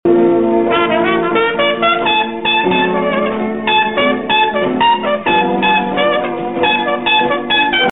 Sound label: blues